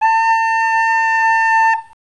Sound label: recorder